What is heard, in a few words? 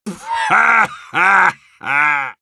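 laugh happy, Russian; Heavy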